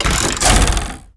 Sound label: Target Dummy, Attack